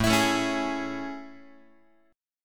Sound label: A Major 7th A, Guitar; AM7 strummed; JGuitar